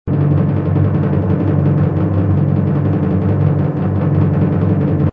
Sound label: Drumroll; Ooh and Aahs What's in the Birthday Box